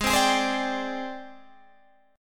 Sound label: Ab Major Sharp 11th Ab down Guitar Standard; AbM#11 strummed; JGuitar